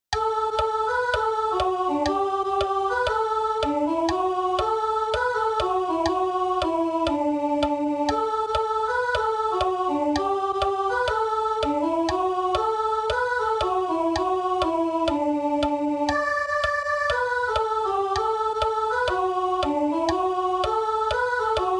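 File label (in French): Que ma bouche, soprano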